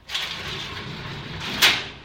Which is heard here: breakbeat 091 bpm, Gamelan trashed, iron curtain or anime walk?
iron curtain